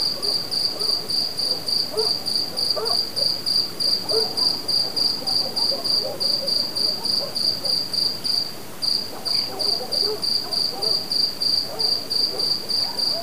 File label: Baillons Crake